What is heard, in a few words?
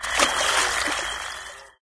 jungle recycle; windows 9x; sound schemes